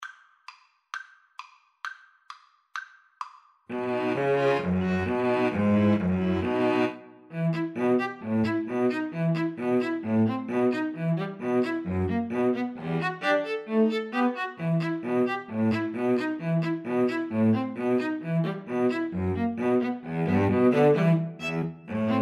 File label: String trio